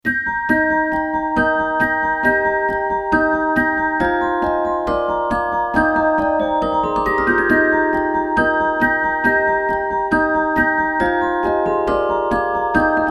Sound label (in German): Ihr Kinderlein kommet, music box organ with 36 note musical movement; Wendt & Kühn